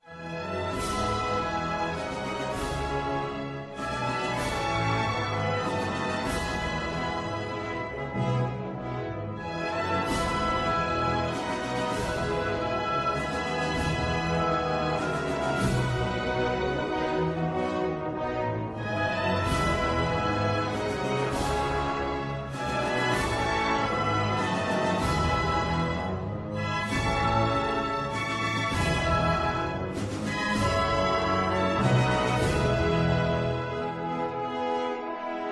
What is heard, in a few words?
anthem